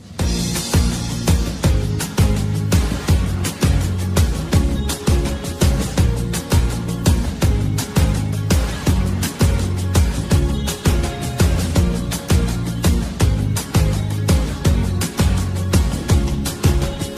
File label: english instrumental ringtone